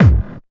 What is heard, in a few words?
KICK hardcore, Techno Trance DRUMS